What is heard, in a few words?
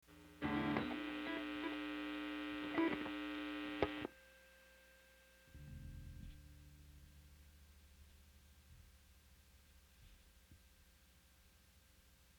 Expensive Drum Samples Mix